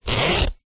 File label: wetsuit zipper; foley